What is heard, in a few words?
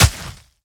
snowball hit; Scrat